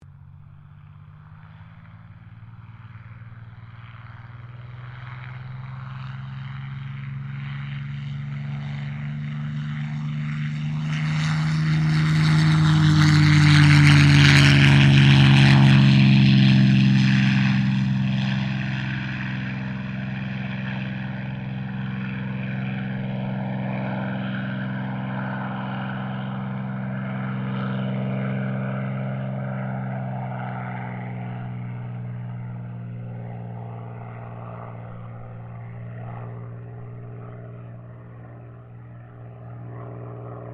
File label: AIRCRAFT SUPERMARINE SPITFIRE MK-XVI TAKEOFF STEREO; Airplane Sound Effects